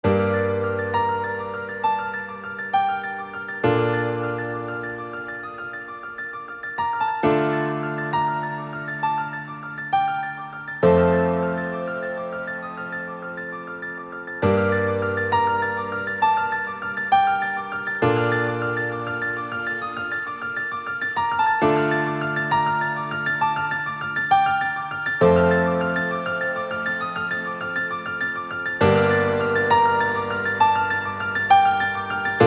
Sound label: piano; delosound